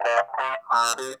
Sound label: CARTOON, Funk Guitar; Zero G - Funk Guitar